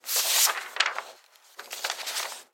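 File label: rustling sheet of paper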